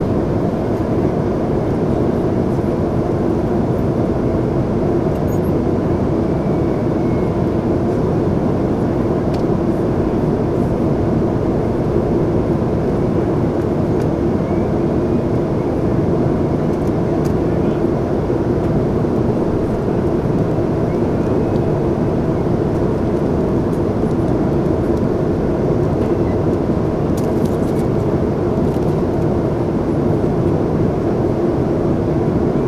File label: ENV INT com air cabin